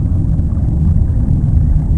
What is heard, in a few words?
water surface; Quantum Redshift